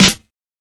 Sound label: reverb steel snare drum b key; Mono; snare drum samples